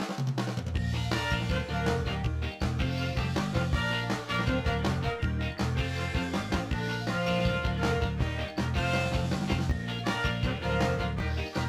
Reward Music; Sesame Street; Ready, Set, Grover; NDS

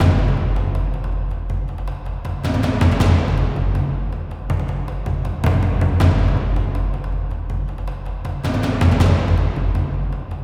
Battle Warrior Drums Loop; rambo the video game 2014

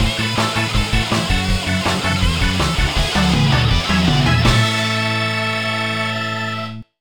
Battle ~ Win; Custom Robo Arena; nintendo ds

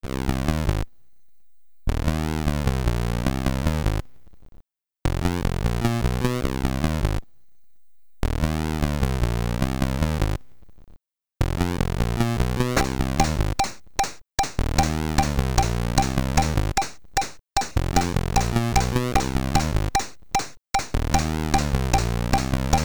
Main Theme, SID Stereo; deflektor commodore 64

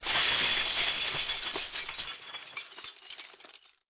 Glass Break; Learn to Fly 2

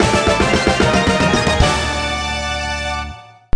Character Jingle

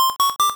Lock On; attack moves; pokemon; gen 2; gsc

